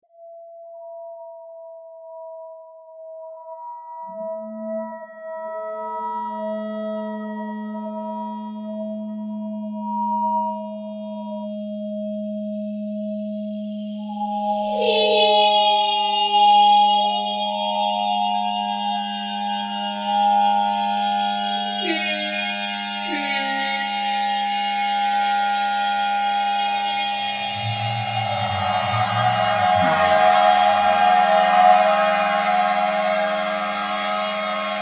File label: Metal Reeds; Frog Peak; soundcollage